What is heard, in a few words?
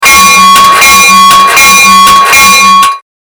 bell